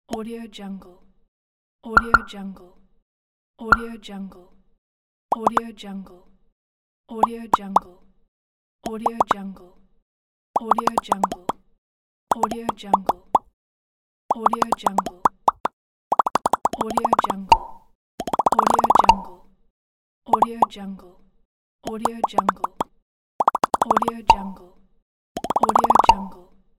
bubbles and pops

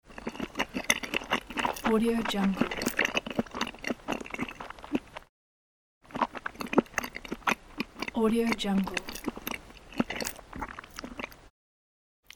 eating and chewing sounds

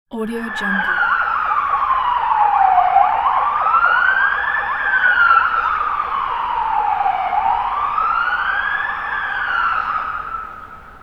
emergency car arrival

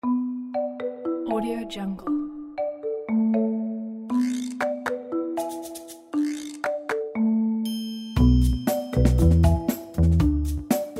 funny cinema

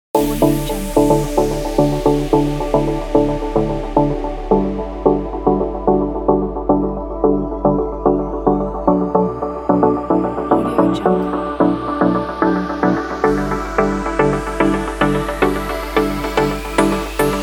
background inspiring pop

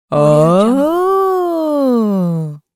female interested surprised

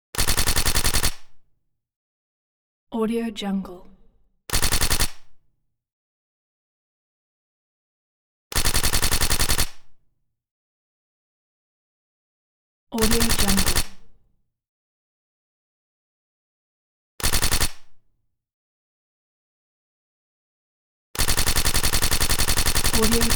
m60e4 machine gun

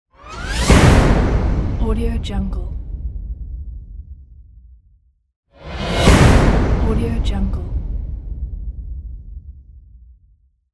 spaceship turbo

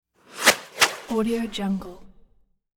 fireworks shooting into sky; hollywoodedge

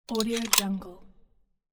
lock

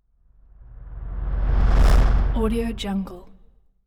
whoosh out

